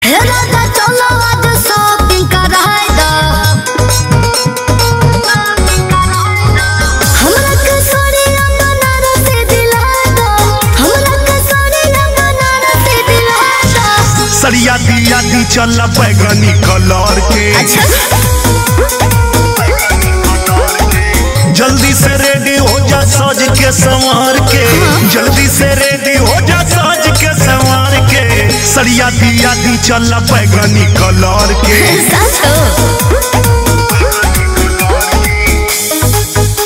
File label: Ringtone